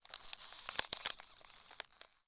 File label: ice